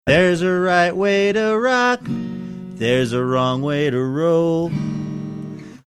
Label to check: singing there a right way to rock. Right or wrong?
Right